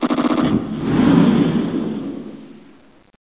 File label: ROCK; TERACIDE